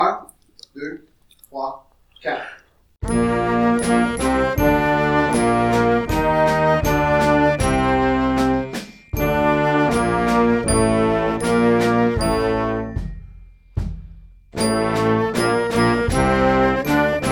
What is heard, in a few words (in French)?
choral artisanal noire a 80